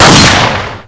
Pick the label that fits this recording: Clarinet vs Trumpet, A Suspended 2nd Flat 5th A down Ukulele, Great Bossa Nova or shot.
shot